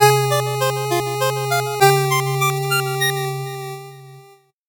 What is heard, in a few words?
8bit jingle normal